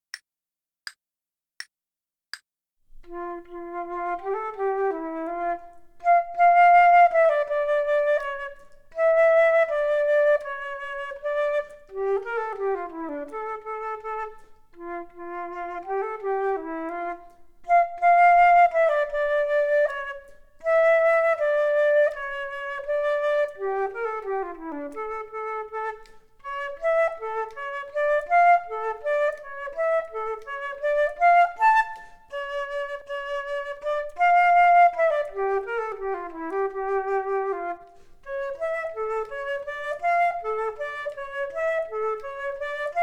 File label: Duets flute; Selected Duets for Flute Podcast